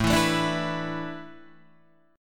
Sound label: A Suspended 2nd Suspended 4th, Guitar, Open D